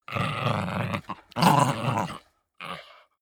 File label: chihuahua snarl fight